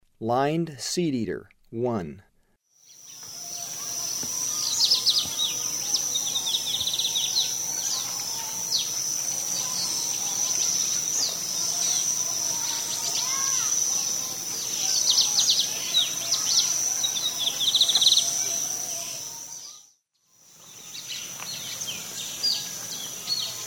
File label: Lined Seedeater; S. America; Eastern Lowlands